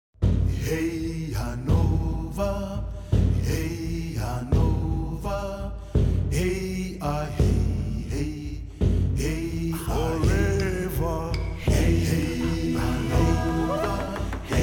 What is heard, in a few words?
SATB divisi Percussion Sprecher